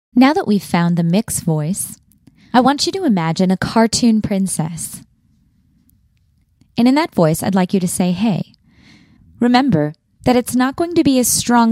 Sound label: Cartoon Princess